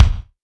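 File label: bass drum, real mega drums; Best Service; Real Mega Drums VOL-1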